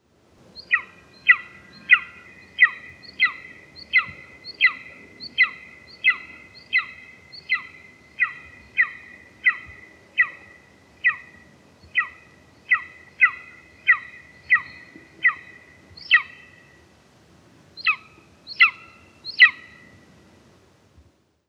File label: Golden Eagle Aquila chrysaetos